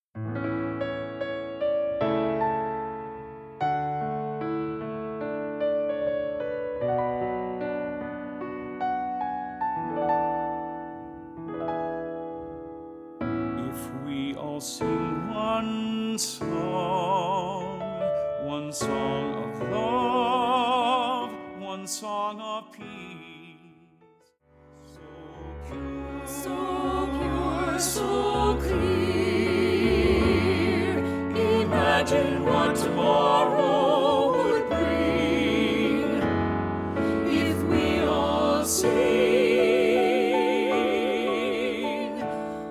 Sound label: Alto